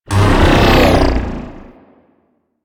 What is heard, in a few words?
Purifier Retract; Ambience; Warframe; Infested; Golem Trial